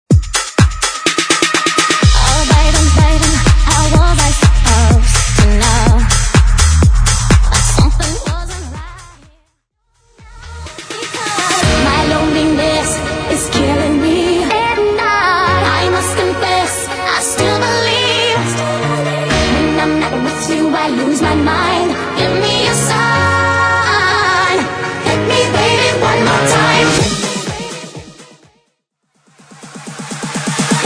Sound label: Techtronic